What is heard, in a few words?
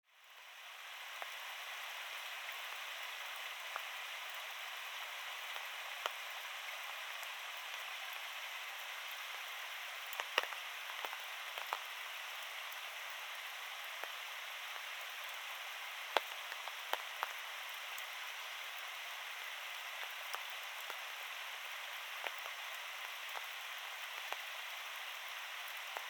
rain forest with umbrella; alishan